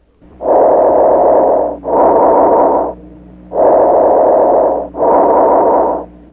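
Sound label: normal bronchial; normal bronial; plice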